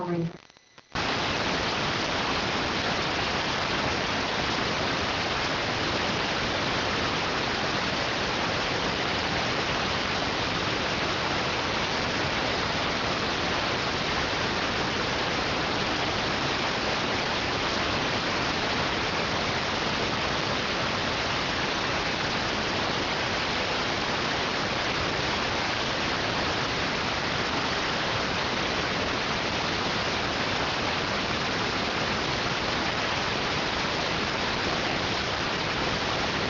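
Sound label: radioteletype receive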